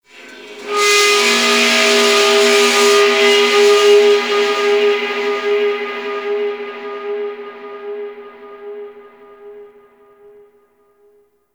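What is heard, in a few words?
Shipyards distant metal bend, Ambience; Warframe; Grineer Shipyards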